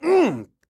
police hit good short; donnie; Blind Drive

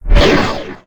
Menu Scifi; Menu FX

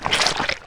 Squish Grab Guts; SFX Kit; Foley - Misc

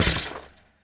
Street Ball Glass Basket Chain; SFX Kit; Street Basketball

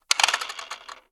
Cog Spin; MECHANICS; mono; MACHINES & MECHANICS; Universal Sound FX

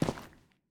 Footsteps; Hero; Blind Survivor

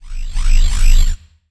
Scifi Synth Tone Disable; Energy & Forcefields

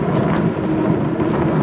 Scrape Chair Slide Wood Loop; SFX Kit; Foley - Misc

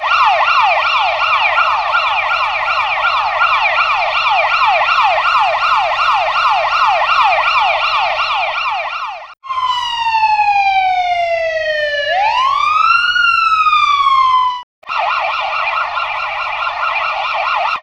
horn police mix, street traffic; best service Blue Box

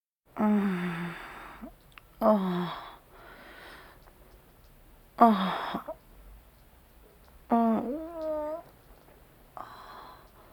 Vocal effects breathing Moaning Baby

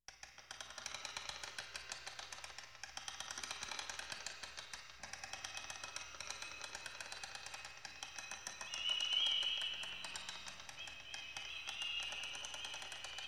Tumblers In Time, horror sounds; Hollywood Edge

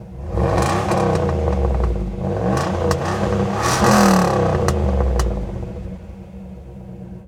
Z28 Revs; Automobiles

